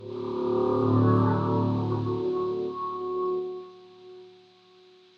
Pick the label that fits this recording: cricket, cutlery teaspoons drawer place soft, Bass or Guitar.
Bass